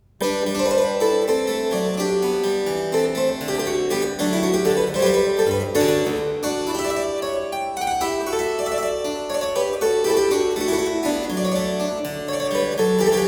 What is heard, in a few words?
Fanfarinette; Rameau